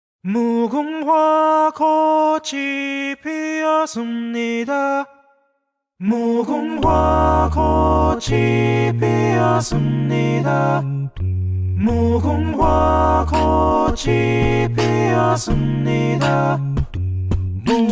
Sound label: harmonizing; squid game; gang